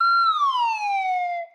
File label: whistle DOWN